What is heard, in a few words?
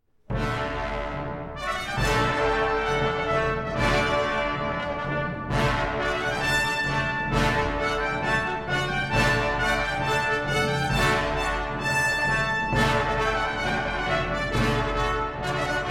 Toccata; L'Orfeo